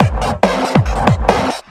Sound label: Breakbeat; Vengeance Samples; VEC2 Loops